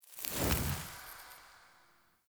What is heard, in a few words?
Fire Blade End; Weapons; Enhancements; Elemental